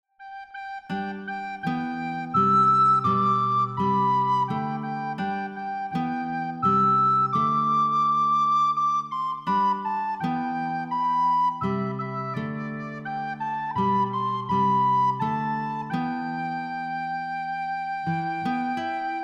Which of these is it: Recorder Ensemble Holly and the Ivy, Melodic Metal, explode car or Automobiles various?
Recorder Ensemble Holly and the Ivy